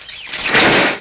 zap; energy

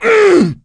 hurt major, coach; survivor

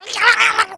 rage, infected, female; npc, action

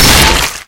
melee axe, weapons

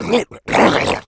rage, infected, male

